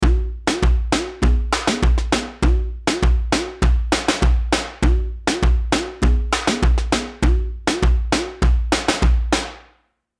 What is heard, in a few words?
Session Percussion; Addictive Drums 2